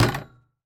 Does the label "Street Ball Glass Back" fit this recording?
Yes